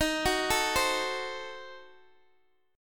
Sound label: Eb Diminished 7th, Guitar Open G; x,x,13,11,10,10; down